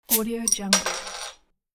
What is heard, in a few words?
open bottle cap